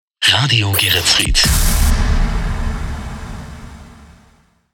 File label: Jingles Shotgun; Radio Geretsried